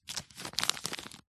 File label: paper wrapping gently handling; foley; zapsplat